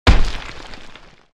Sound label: Bounce; mega boulder rampage gamerip